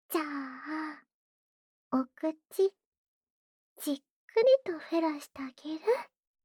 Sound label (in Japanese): 無垢 ねっとり口フェラ; フェラ素材